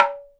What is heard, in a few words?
Perc, Alesis DM5